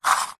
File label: battery tester plastic slider slide